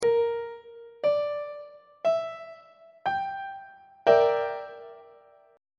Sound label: e half diminished 7th 2nd inversion; on treble clef